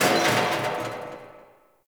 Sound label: Garbage Can Rattle; Warframe; Ambience; Nineteen Ninety Nine